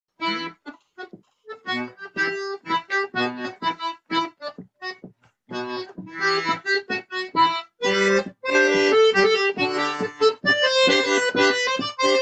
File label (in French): Plinn Ton Double Rapide; Les-Petits-Packs-Vitry